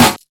sparkle snare single hit b key, acoustic snare sound tuned to the B note